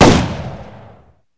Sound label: aug, weapons